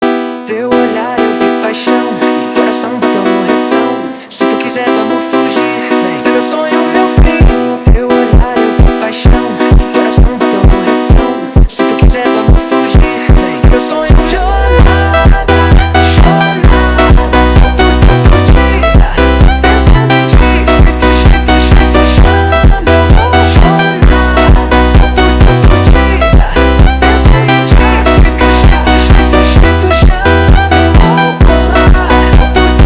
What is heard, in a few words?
phonk